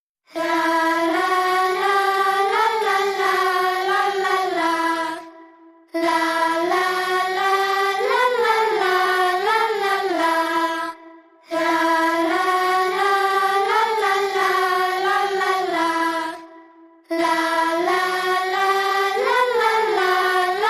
Choir Only

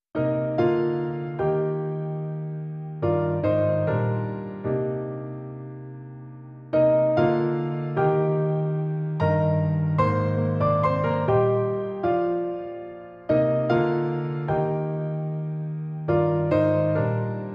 Solo Piano

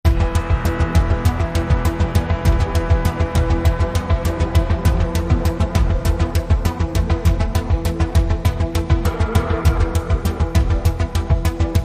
No Strings

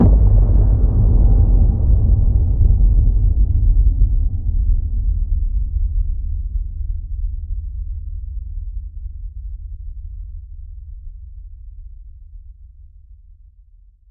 Hit Low

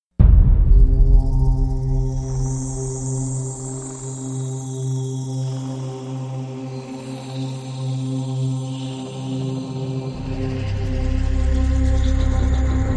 Ominous Soundtrack

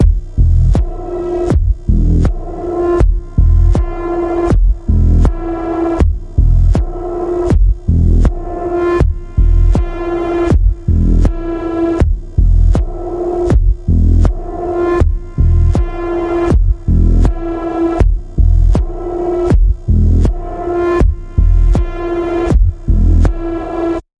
Throbbing Pulse E, Key of E

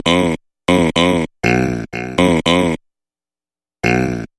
Oh Vocal Variation

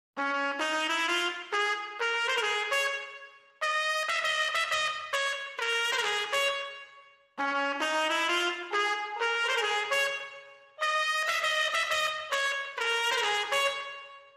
Trumpets Clean

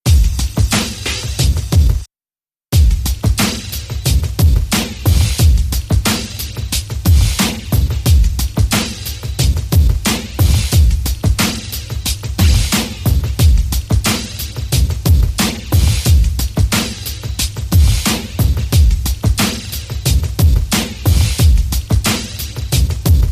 Beat

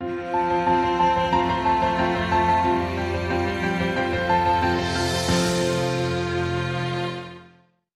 Success Story, Sting

